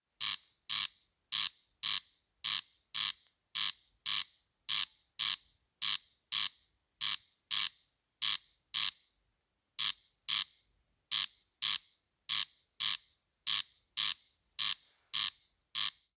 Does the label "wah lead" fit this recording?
No